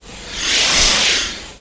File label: impact bolt; Earth and Beyond; EA Games